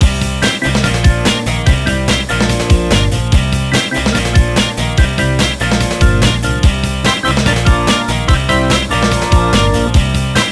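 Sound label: Music, Nintendo DS; Chrysler Classic Racing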